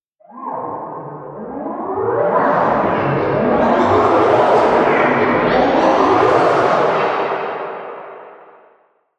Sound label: Ghost Whoosh; Ghost Sound Effects; horror